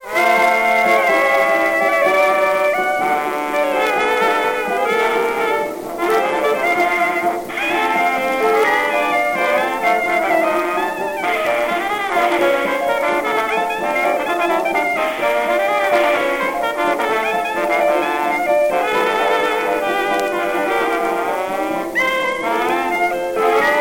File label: jukebox